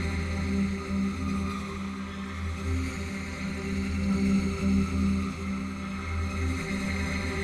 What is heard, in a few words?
Ring Interface; Game rip; MiSide